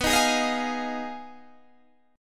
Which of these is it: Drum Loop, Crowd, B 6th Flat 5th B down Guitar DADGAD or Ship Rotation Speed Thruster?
B 6th Flat 5th B down Guitar DADGAD